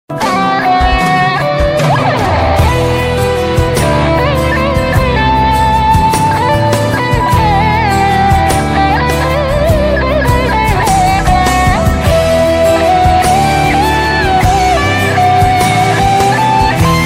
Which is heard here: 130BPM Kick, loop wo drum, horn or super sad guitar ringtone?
super sad guitar ringtone